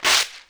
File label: TEAR UP, Anime Sound FX